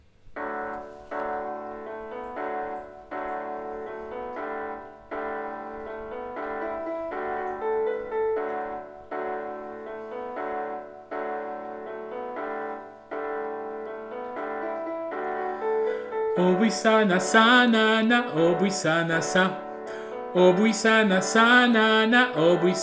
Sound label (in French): melodie avec piano